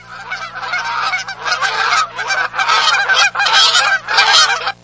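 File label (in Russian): оглушительные крики летящих птиц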